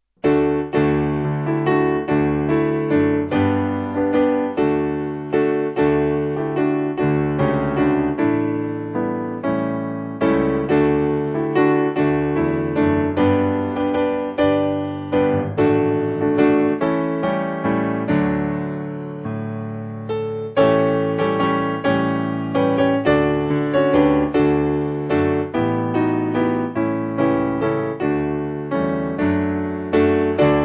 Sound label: Piano; A Wonderful Saviour; Hear the footsteps of Jesus